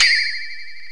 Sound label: AIR, INDUSTRIAL; TEK AIR